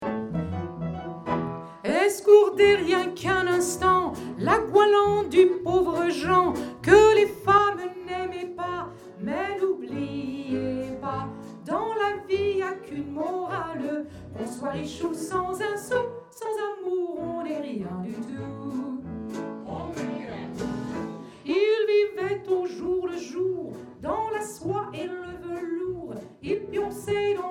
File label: Tribute Singer